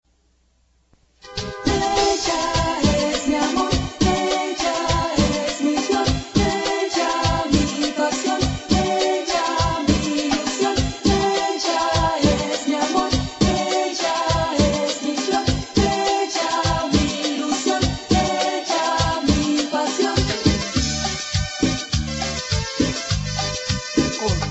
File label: tropical technopop